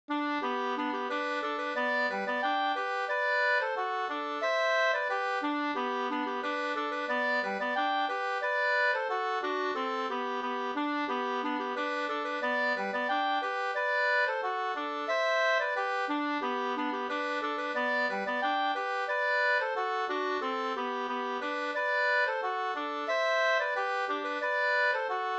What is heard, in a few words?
polka